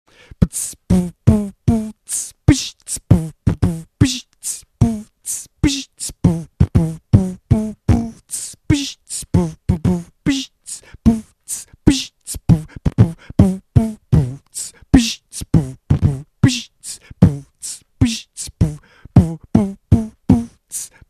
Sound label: beatbox